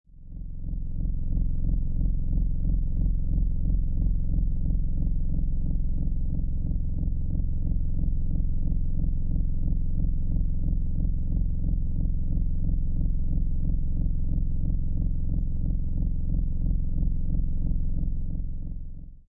Stable Core Reactor, Low intensity, even pulsating space; Stable Core Reactor (looping): Low intensity, even pulsating space engine or machine room; Seamlessly looping; Lynne Music